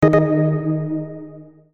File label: event mild big jingle